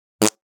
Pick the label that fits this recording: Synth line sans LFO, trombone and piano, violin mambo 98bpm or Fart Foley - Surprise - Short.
Fart Foley - Surprise - Short